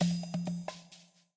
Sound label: Chat Message Sound